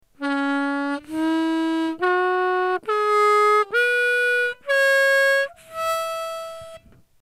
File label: Harmonica 5EDO